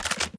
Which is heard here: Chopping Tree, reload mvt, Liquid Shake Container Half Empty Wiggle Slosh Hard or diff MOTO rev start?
reload mvt